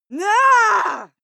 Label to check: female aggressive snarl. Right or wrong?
Right